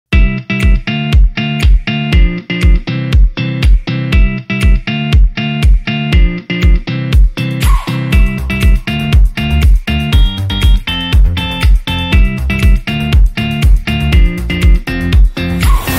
musica de fondo energetica y positiv; musica de fondo para videos

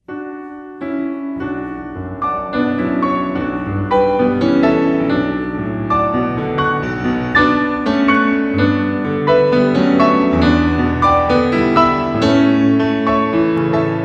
Pasillo en si menor de las campanas

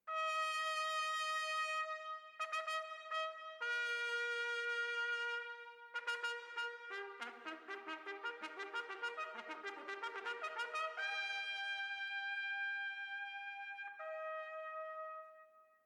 trompeta

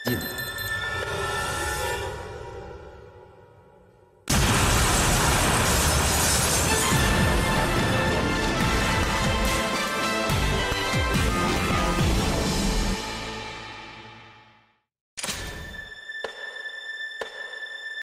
explota

